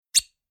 Bar Whiskey Liquor Bottle Closing Squeaky Cork; Replacing; Drink Mixing

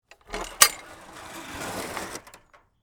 Gate Open Coral, Fence; Gates; BlastWave

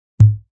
Bass Button; Rollover or button sound perfect for web pages, flash animation, and other web applications.; Bass Button Sound Effects

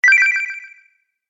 Science fiction Activate Sound

